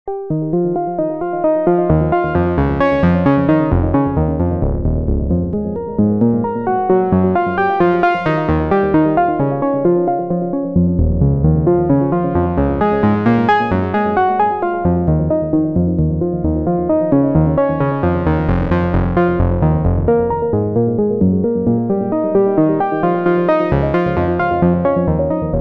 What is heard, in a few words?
echo sequence